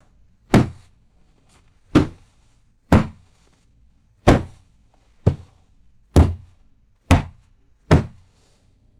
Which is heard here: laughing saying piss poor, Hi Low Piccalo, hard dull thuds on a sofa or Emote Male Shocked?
hard dull thuds on a sofa